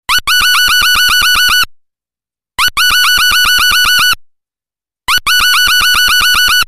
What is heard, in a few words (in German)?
wendehals, Vögel; midi; Polyfonisk musik; siemens